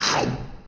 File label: death chomp; smite; chaac